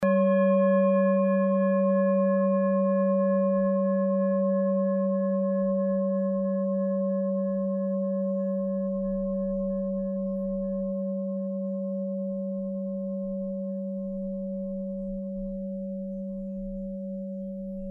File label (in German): klangschale set; Klangschalen-Chakraset N°12 für alle Chakras